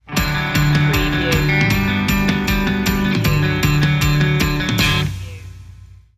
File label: free flowing stinger